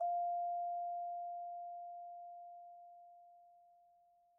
Vibes soft F4, Vibraphone, Soft Mallets; Struck Idiophones

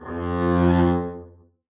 arco f2 ff up, double bass; dsmolken

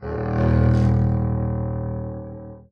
arco eb1 ff down, double bass; dsmolken

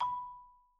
Marimba hit Outrigger B4 loud; Idiophones; Struck Idiophones

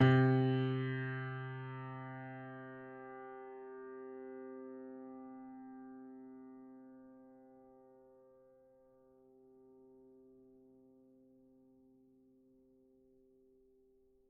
GPiano sus C2, Grand Piano, Kawai; Chordophones; Sustains

